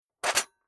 Tool Box Putting Down Top Tool Tray Box Metal Impact Rattle